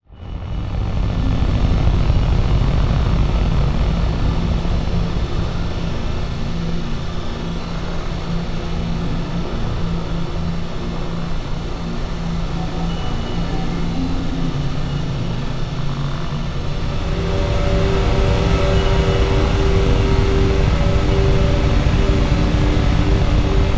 drone ambience; Bluezone; Dioxide Cinematic Soundscapes and Sound Effects

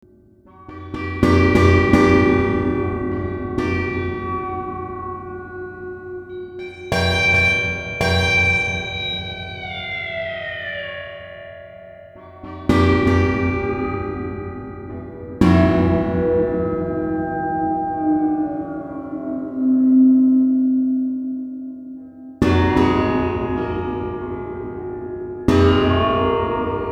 Orchestral Phrase; FXHome

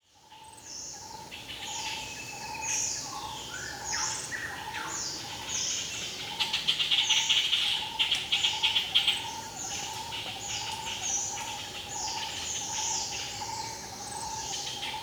Jungle quiet insects and birds wide, Thailand; Charlie Atanasyan; Faunethic

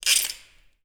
Glass Scrape; 99Sounds; The Warehouse

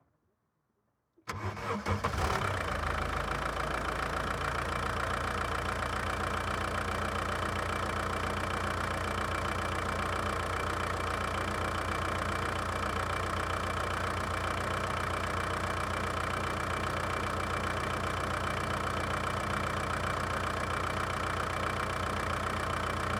Volkswagen Golf II 1.6 Diesel Engine Start Idle; Mono; SoundHolder

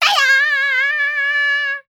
Child, Anger Fight, Fight Vocalizations; Articulated Sounds; EMOTE; Ninja

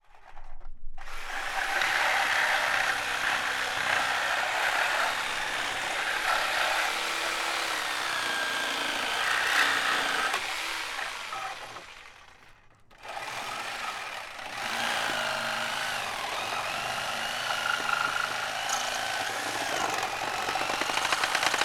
Reciprocating Saw; Power Tools; Wav Junction Sound Effects

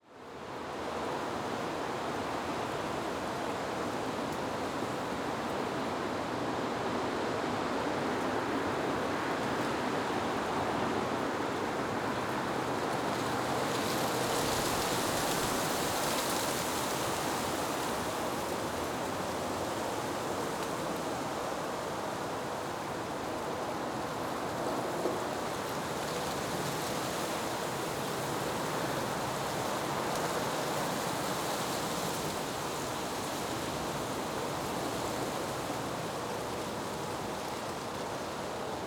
Wind Trees Cattails; Soundopolis; Natures Fury; Fienup

